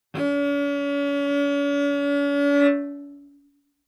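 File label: Cello arco fortissimo sul D Db4; MIS Pitches - 2014; Strings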